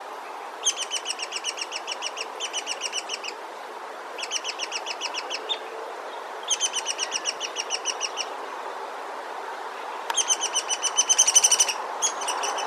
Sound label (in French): Pic mineur; Teresa Dolman